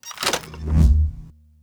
lever on

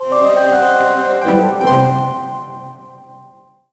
Music Stinger Success; monster lab; nds

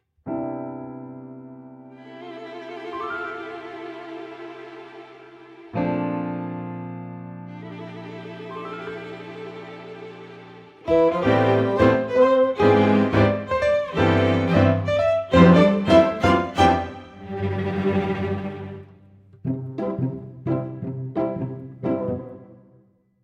bigcity lowkey head 175bpm CMaj 68beats

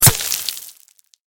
Bullet Impact

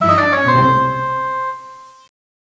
Incorrect Weight; Escape the Museum; nds

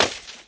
snowball damaged; Angry Birds Seasons

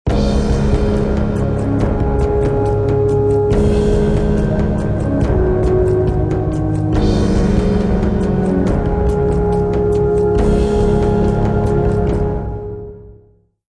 Ancient Combat; Civilization Revolution; Xbox

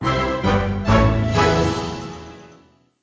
Win fare; Swampy

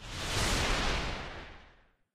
Meteor Flight

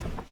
Footstep Carpet; this is the zodiac speaking 2020 gamerip switch ps4 xbox one pc macos

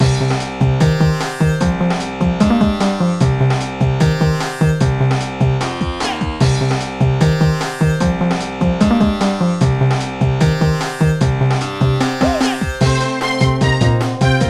Hip-Hop Stage; pinky street kira kira music night pop town gamerip

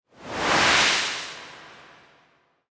whiteout

